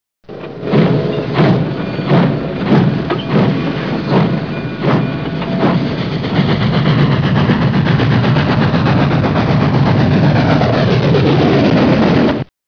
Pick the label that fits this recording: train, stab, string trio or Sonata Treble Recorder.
train